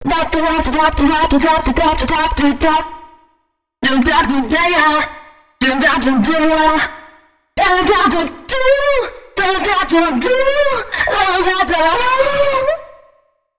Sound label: female c#, Dance Ultra Voice FX; Mutekki Media